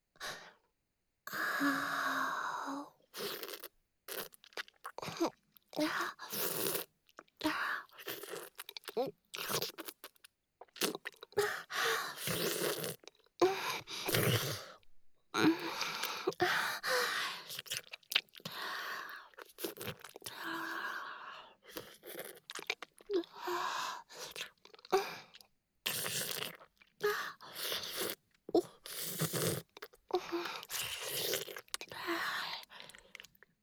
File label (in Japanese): フェラ(口交)